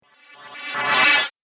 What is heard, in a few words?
Laser Shatter; Laser Sound Sound Effects